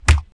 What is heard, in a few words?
bod strk, bugs; Starship Troopers